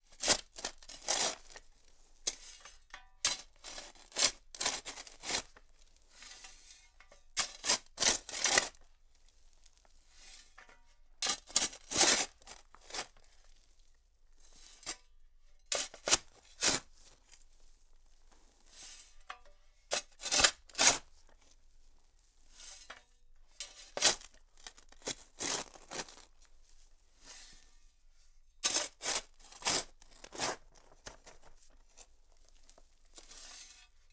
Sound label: Digging With Shovel; Fairlight Sound Lib